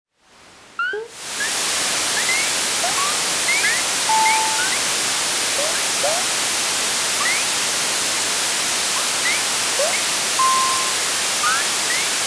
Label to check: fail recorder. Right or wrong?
Wrong